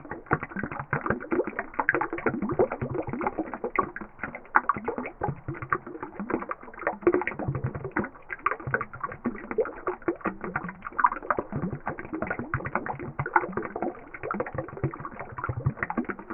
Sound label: Slow Water Loop